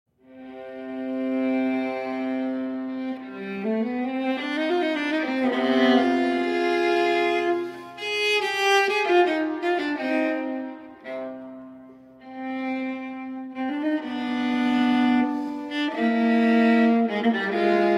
Suite No. 5 in C Minor I. Prelude (Arr. For Viola)